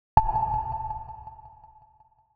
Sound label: Anxiety Sound